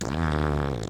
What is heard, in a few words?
fart